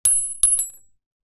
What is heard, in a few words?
cart